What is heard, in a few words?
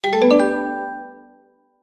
material buy success